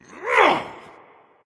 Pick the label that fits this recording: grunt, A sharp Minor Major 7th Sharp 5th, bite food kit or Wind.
grunt